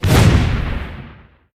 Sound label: jalapeno; Plants vs. Zombies